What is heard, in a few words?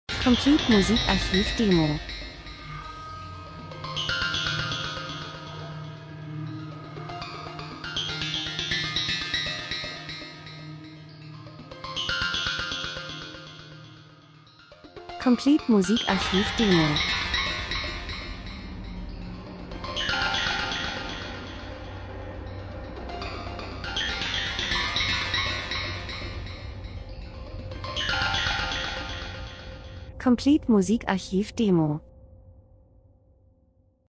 drums; Elektro Rhythmus Chaos, Aktion, Zeitraffer, Menschen Zeitgeschehen Maschine Industrie Dokumentation